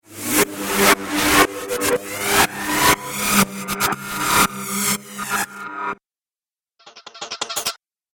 Dubstep Scifi Effects

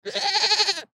Goat Bah Angry Long; Farms; Backgrounds; Animal; Blastwave

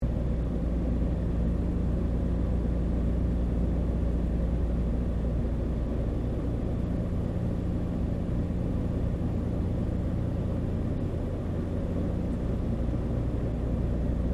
AIRCRAFT DOUGLAS DC3 FLYING INTERIOR; Qantas 1942 DC3; Airplanes; Vehicles

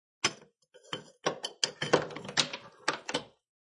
Insert Big Key Into Keyhole; Locks (Sound Effects:Objects)

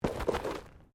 Body movement Slide Gravel

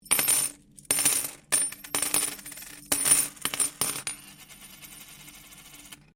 Coin Penny Multiple Drop Laminate; Common coins; Money; Objects

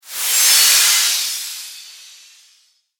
Transformation Whoosh, Science Fiction

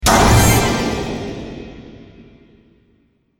Magic Metal Explosion

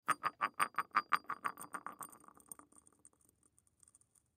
Glass Candy Dish Lid Wobble; Dishware; Household; Blastwave; Foley

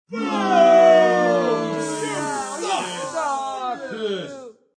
Boo Hiss Group, Exclamation

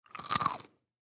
Human Bite Food; Bite Food Chew Crunch Walnut; Eating; Blastwave

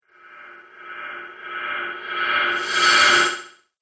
Reverse Cymbal Whoosh

